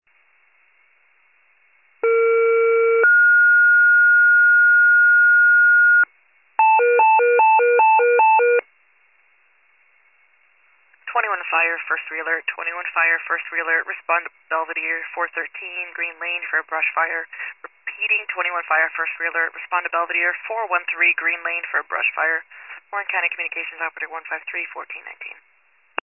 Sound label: fire, pager alert